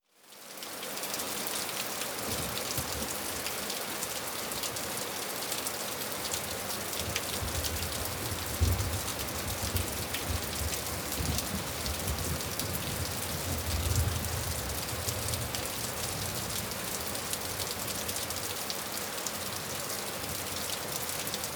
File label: rain